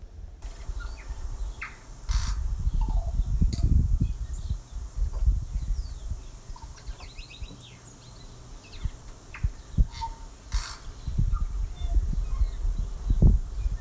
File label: bird sounds from the kitchen